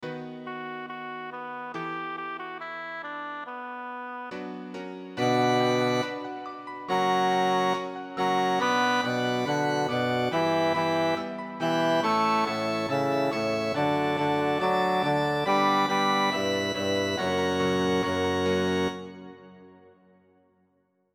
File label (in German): coro wir haben keinen koenig bass; bach johann sebastian; johannes passion